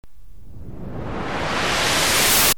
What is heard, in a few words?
noise; nord3